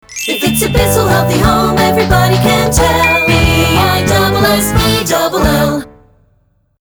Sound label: Ringtone